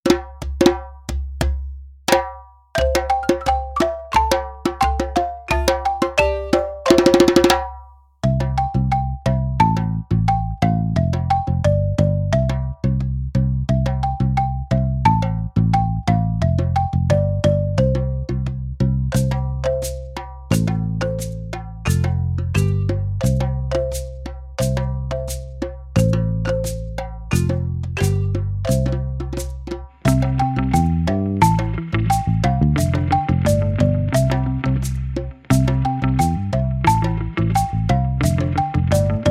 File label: karaoke; Obwisana